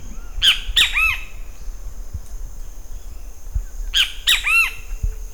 Pitangus sulphuratus

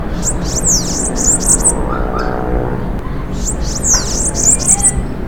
Coereba flaveola song

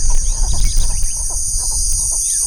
Bubulcus ibis

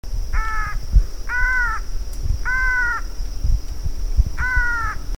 Thamnophilus caerulescens contact; VARIABLE ANTSHRIKE; contact call ssp dinelli

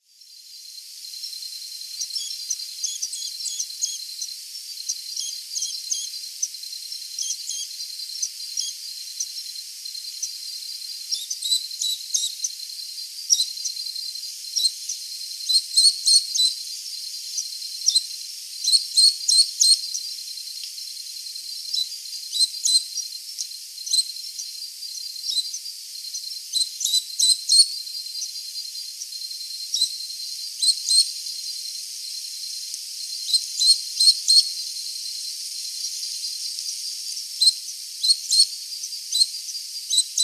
Accipiter superciliosus long song, Santa Rosa

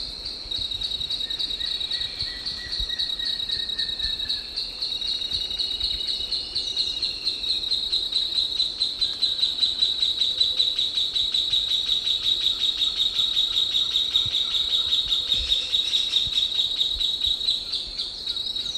Dendrocincla turdinus

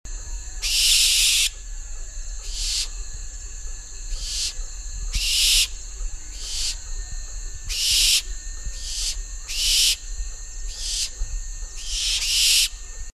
Tyto alba juvs; procosara

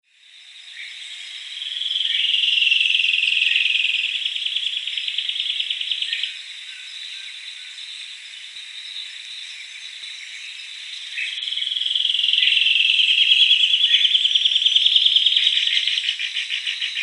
Philydor atricapillum

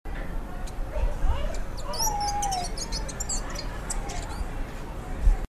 Thraupis bonariensis

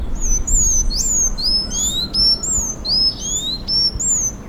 Thraupis sayaca long

